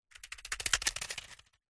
insect spider large run scuttle past on stones gravel; zapsplat